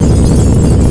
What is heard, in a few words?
freezer ice